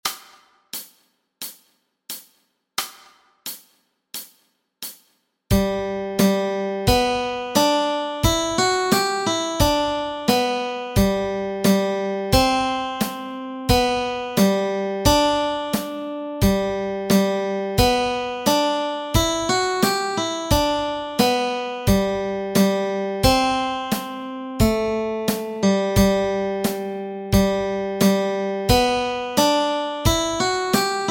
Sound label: melody